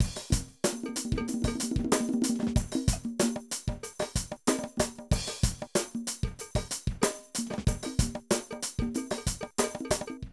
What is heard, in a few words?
Drum loops, 70s funk